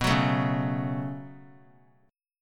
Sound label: B 7th Suspended 2nd; B7sus2; strummed; JGuitar